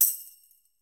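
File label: Tamborim C Hit; Latin Percussion; Drums; Drum Hits; Tambourin; Ableton; Factory Packs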